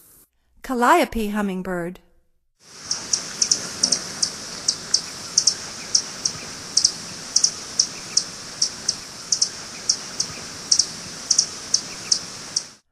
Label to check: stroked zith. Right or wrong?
Wrong